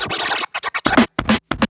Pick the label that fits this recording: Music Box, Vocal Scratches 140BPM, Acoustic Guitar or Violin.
Vocal Scratches 140BPM